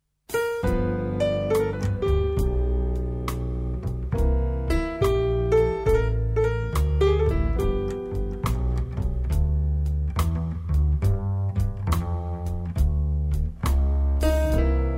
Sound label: Quartet